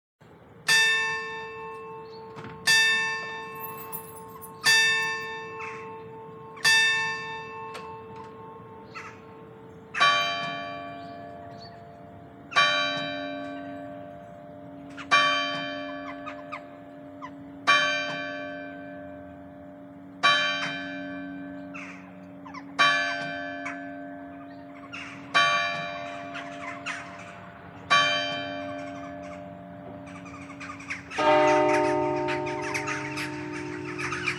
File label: bells church; best service Blue Box